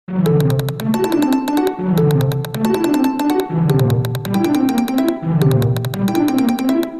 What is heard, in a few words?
Alarm Tone